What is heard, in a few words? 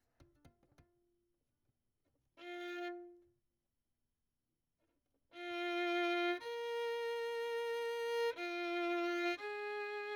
Violin; Verdigris Music